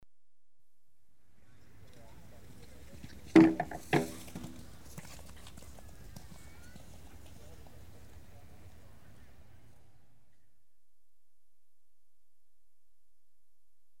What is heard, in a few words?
Show Jumping - one horse jumps and knocks fence; Equestrian Events; BBC Sound Effects Library